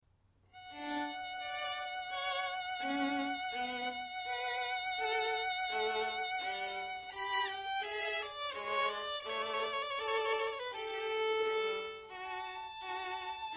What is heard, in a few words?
air duet, violin; bach